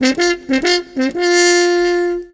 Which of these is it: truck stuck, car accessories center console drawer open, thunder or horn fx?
horn fx